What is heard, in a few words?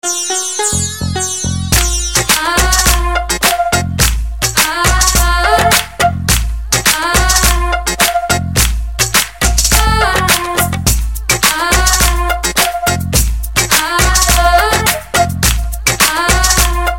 Awesome Tone